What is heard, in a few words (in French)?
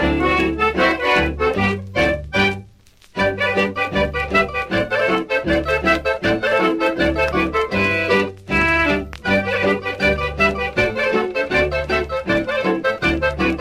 Fado - Portugal